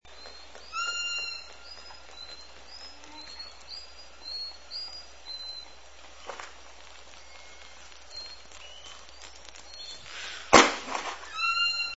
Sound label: whistles percussives, orca